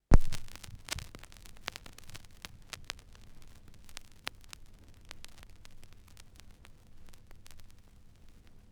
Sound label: Turntablism; Sonokinetic - The Roots Drumloops